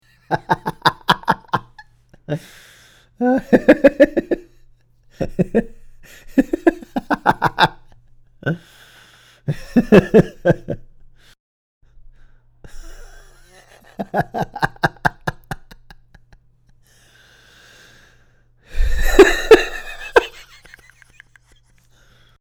laughing sound Male

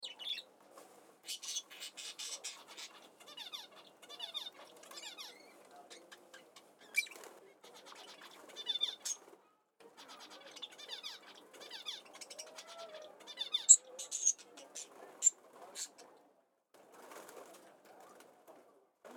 Bird sounds

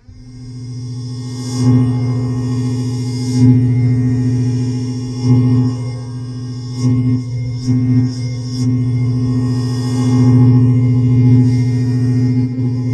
lightsaber

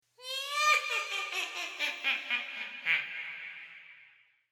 witch

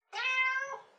cat sounds short

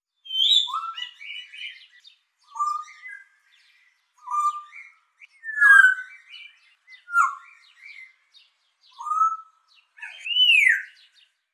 African parrot; African grey parrot

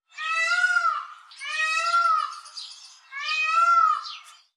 peacock sounds

